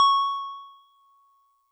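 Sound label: DigiPianet DX100 C sharp 7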